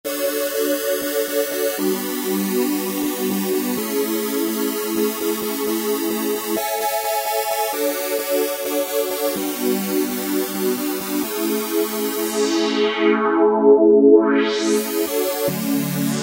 M7 Chord, Korg microKORG; Factory Voices; Artcore

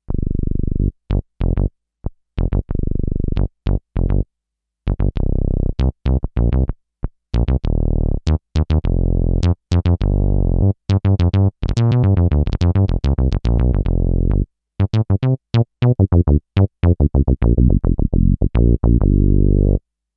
Warm Bass, Kawai K5000S

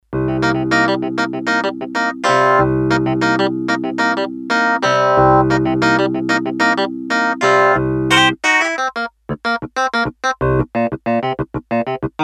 Clav, Korg DS-8; Factory Patches

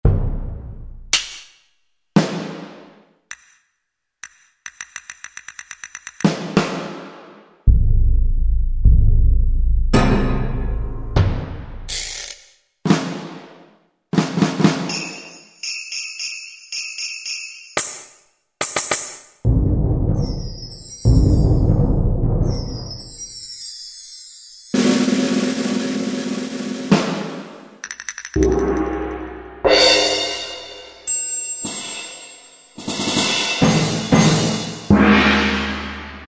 Rhythm Set, Orchestral

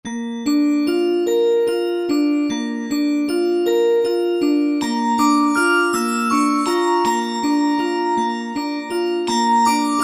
Bells

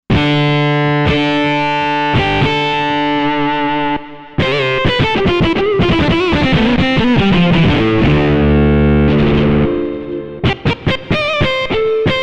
Gt Lead Switch

